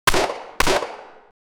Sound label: one shotgun shot 16 bit